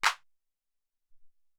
Clap, Alesis SR-18

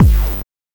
BANK1 BD, NES DPCM; MIDINES

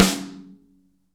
SN AMBIENT, Real Mega Drums

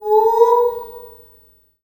DOVECALL, Heart of Africa; Spectrasonics; BIRDMEN

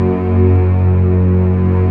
STR Orchestral Strings

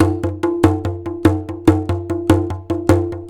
TABLA; USB Soundscan vol.45 - Indian Traditions; AKAI

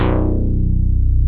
MINIMOOG

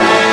found orch; E-MU Formula 4000 Series Vol. 2; Dance Hits

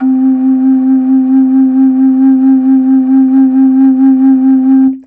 c3, MOCENO BASSFLUTE; World Instruments 2; WOODWIND AND BRASS

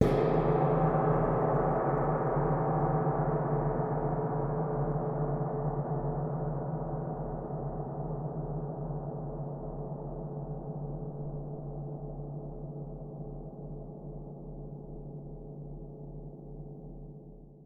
Gong

